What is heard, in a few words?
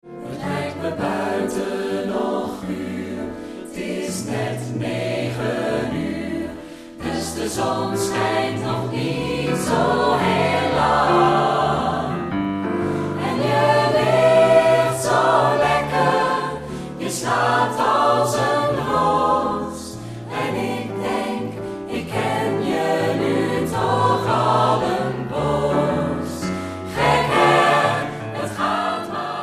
piano